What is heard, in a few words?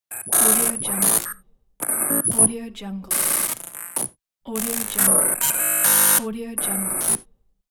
glitch fx